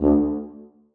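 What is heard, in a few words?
tuba staccato c#3; Sonatina Symphonic Orchestra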